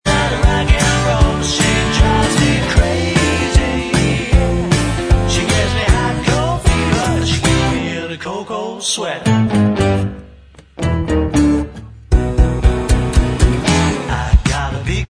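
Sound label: backing vocals, backing track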